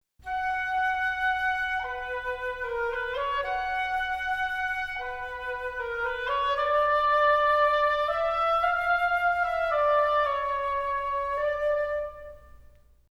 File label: flute oboe